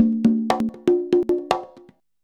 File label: CONGA 119